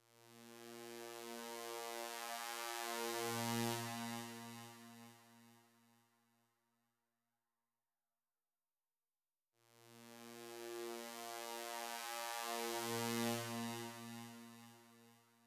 Noise FX Ambient; Jumbly

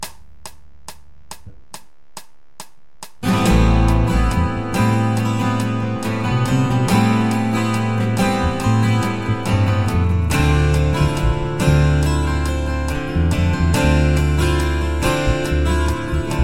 28 Strings; Smaller Wing

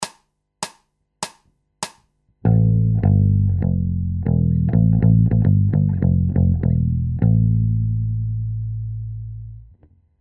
Bass Loops

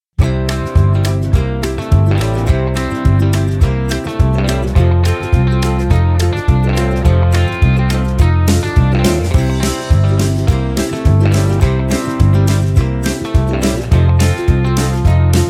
Ukulele Vs. Kazoo and Whistle Too

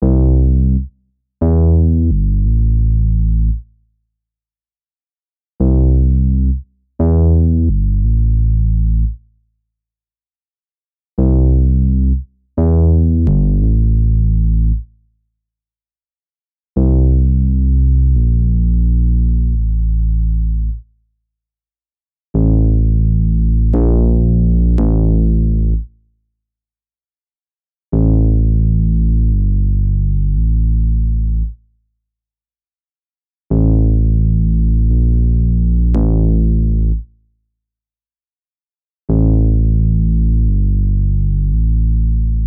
trip hop beat

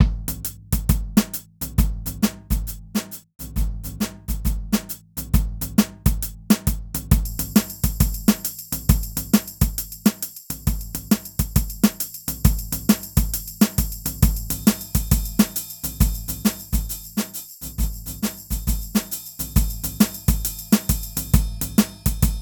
jazzy beats and percussion 135 loop

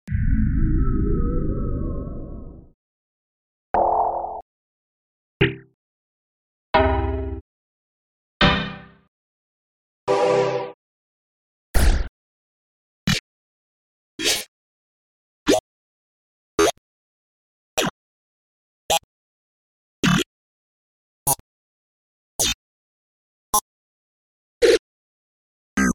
Experimental Downtempo

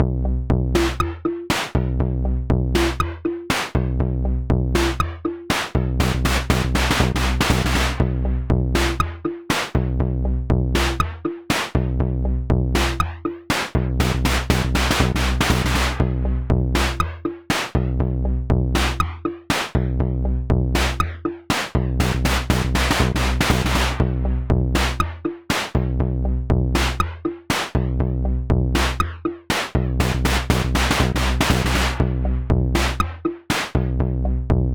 120bpm beat

